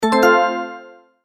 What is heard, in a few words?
multimedia tone advance; Tones (Sound Effects:Sound Design); McKinney Sound